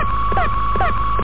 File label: Sec Button; Amiga Games 1996 #7; AlienSpace